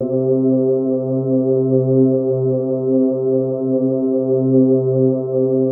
GLAMA C2, SYNTHVOICES; Music Store - Claudius Diemeter Schwerlos

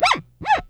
SCRATCH, Bass; EFX SCRATCHS

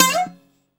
BZ GLISUP, BOUZ

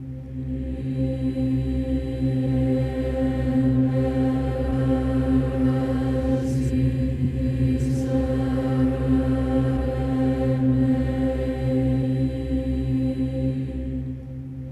OCT MISER, Symphony of Voices; Spectrasonics

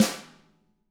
DW HIT, Drums; ILIO; Double Platinum Drums 1

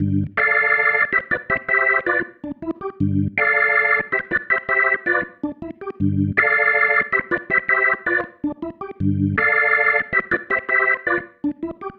Progression, Organ; Loops; Sonic Foundry; Joe Vitale Organ Donor

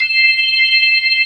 B3 Hi Slow C4; Proteus 2000; Multisamples; Digital Sound Factory Proteus 2000

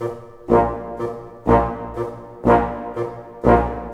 SNAR, Snare Army; East West - Scoring Tools

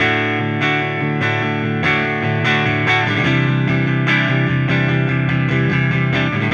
Clean Strum Guitar, Indie Rock; Sonic Foundry; Indie Rock Anthems; Silos

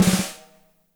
DRY SN, dry kit; DRY KIT 2

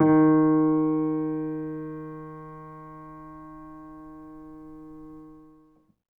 Acoustic Piano

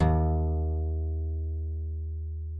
ACBASS D#3; Sonic Reality; American Heartland

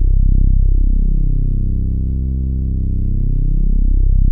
ANAPAD C1, ANALOG PAD; Music Store - Claudius Diemeter Schwerlos

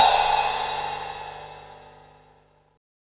RIDE, Drums Acoustic

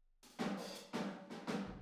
Boogaloo - Room ST, 82 BPM; Drums; Intro; Sonic Foundry; Drums from the Big Room The Mixes

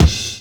BD, bass drum, 70/s Breakbeats; BASSDRUM1; USB Soundscan vol.46; AKAI

